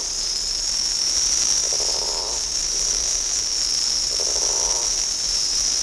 Water

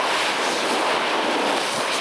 snow loop

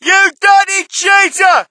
crowd, shout; Mike Tyson Heavyweight Boxing

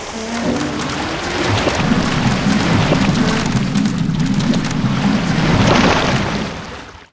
ship destruct; Sid Meiers Pirates; XBOX

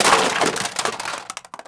droid fall

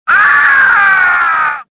death, worker; worker2

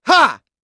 throw; UFC Tapout; XBOX

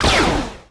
rifle fire; weapons; trando

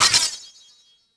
item pickup weapon; interface

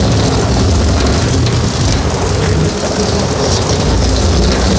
roll skylights, terrains; Tony Hawk's Project 8; XBOX

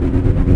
metal eng; Quantum Redshift; XBOX; kukai

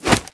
Handgun Whip

